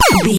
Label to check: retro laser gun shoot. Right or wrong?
Right